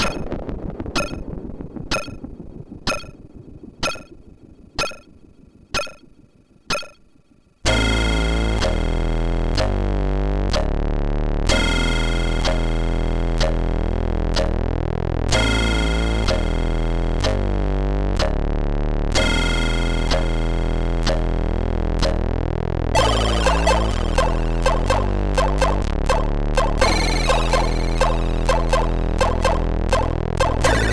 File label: sid, MOS6581R3